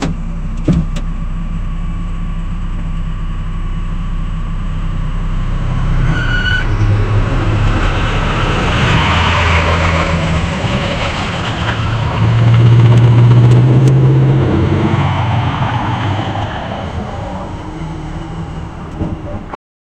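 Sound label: limousine approaching slow; brakes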